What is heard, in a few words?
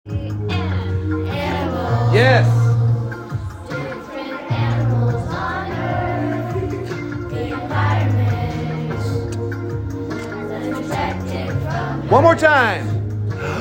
Lee chorus practice